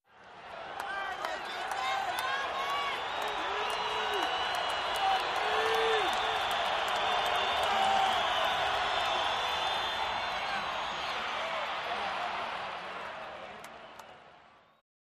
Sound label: Football Crowd Exci, sports arena football